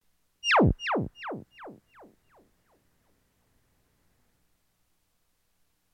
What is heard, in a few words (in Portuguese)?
theremim